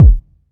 fluffy bass drum sound c key

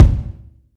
verby bass drum sound f sharp key; kick drum samples

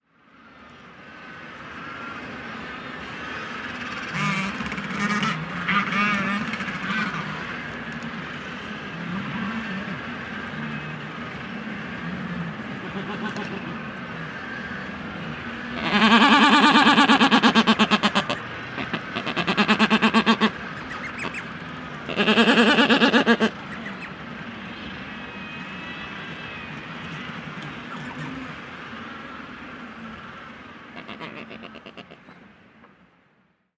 black-browed albatross and macaroni penguins

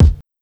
natural bass drum d sharp key; kick drum

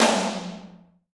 hi tom drum single hit f key; tom drum samples; one shots

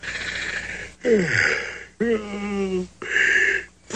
yawns

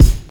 trebly boom bap hip hop kick drum F sharp key

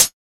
natural hihat sound g key, tuned drums

